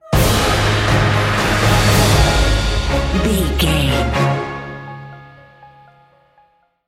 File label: sunshine morning cinematic corporate sting bumper